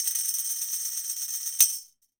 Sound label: Tambourine Roll Slap; Sonic Foundry (Sony Creative Software); Joe Vitale Latin Percussion